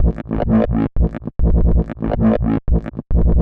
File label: 140 FX LOOPS